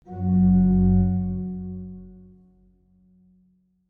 organy F#1

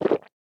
polykanie besti

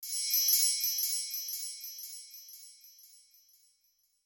chwycenie sakwy; apocalipsis

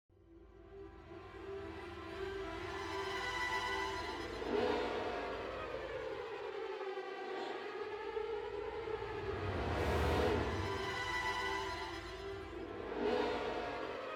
Muzyka Napiecie; apocalipsis

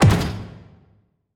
mocne uderzenie

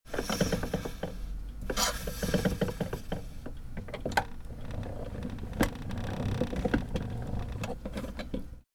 przesuwanie maszyny na kolkach

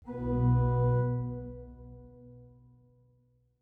organy H1

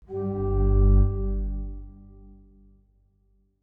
organy G1; apocalipsis

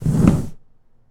przestawianie kosza

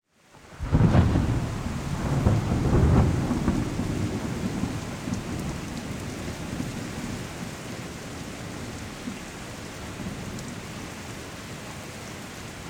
podklad deszcz burza

